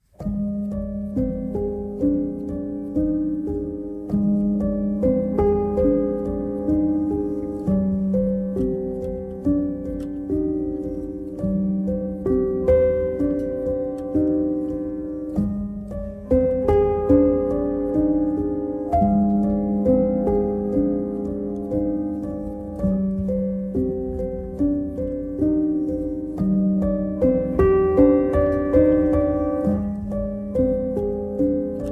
Piano

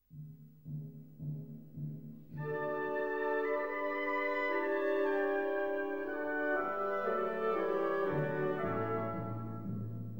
Concerto in D Major for Violin and Orchestra

